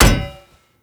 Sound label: 2 handed mace strike; Rust